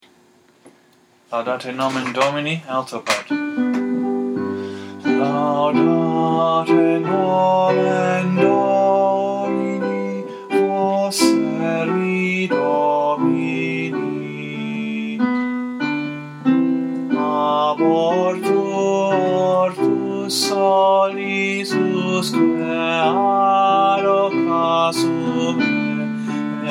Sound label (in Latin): laudate nomen domini alto